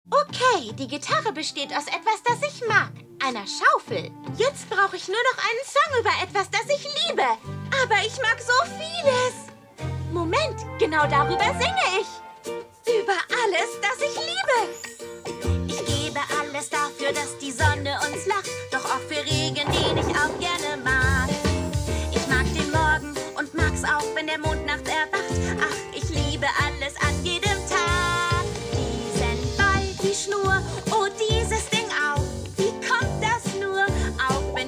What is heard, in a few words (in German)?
Gesang; Disney